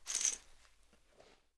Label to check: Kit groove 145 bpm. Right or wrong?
Wrong